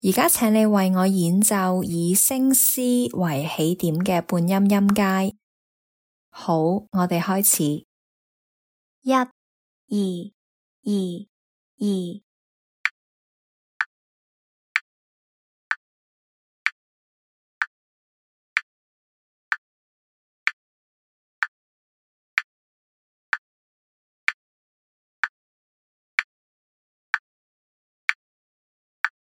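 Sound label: chromatic scale starting C sharp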